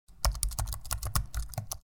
type laptop

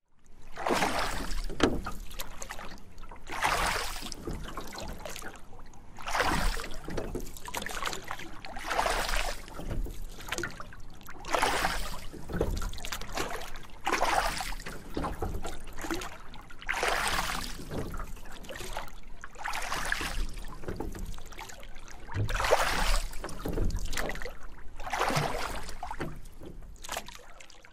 Rowing In Ocean

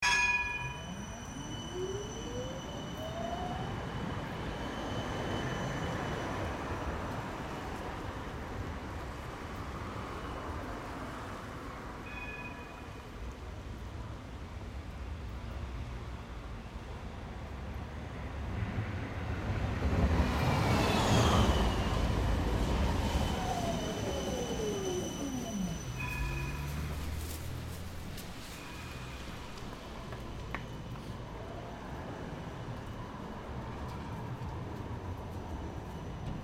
train movement

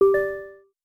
notification mobile

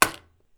wood blow

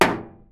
metal

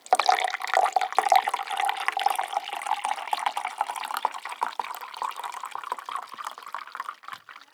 pouring water

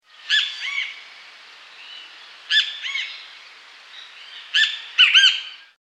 parrot; افکت صوتی

